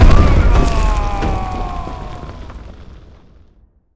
Smash Enter

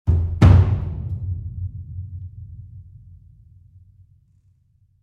Marimba and Drums Mood; gamerip; the last of us

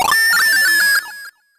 Blow off; puchicon; dsi ds

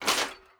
grate opening

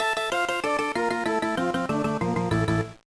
Defeat; Metal Max 2 Kai; gba

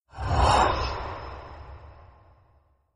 demon die sound; Arc of Templar

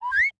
Bumpburst

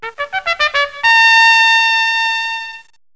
TRUMPET

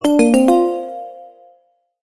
Board Game SFX (Event Type 1); animal crossing amiibo festival

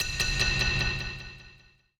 BGM stinger, BGM Player Dying Out; Babylon's Fall

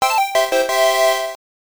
Score Fanfare; Dig Dug II; X68000

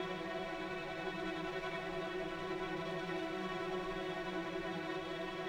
Suspence

